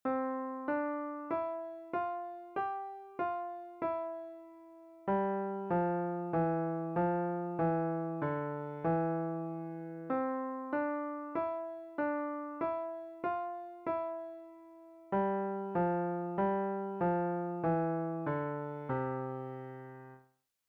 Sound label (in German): Start Up, Klavier